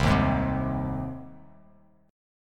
C Minor Sharp 5th, Cm#5 chord; strummed; JGuitar

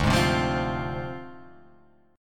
D Minor 7th Flat 5th; Dm7b5; strummed

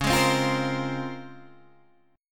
Db Major 13th, Guitar Open G

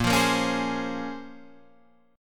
Bb Minor 9th Bb, Guitar, Standard; Bbm9; strummed; 6,8,8,6,9,8; JGuitar

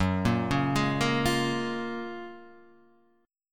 Gb Major 9th, down, Guitar, Half Step Down

